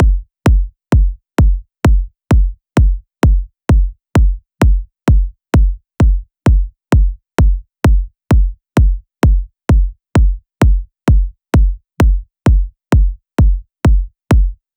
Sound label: Drum Loop - 130 BPM Kick; Loop Stems; Cymatics; DOPE DRUMS VOL. 1; Steam